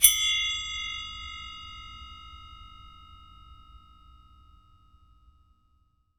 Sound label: Bell, World Percussion; Sony CS